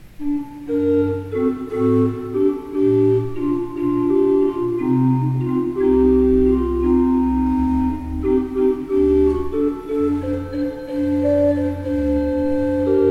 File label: pipeorgans